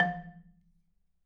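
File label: F2, Marimba, dead note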